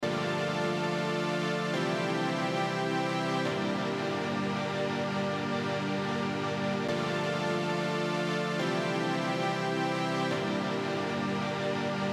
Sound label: multiphonics formant filter dry; multiphonics cv 2 audio fx; adam pietruszko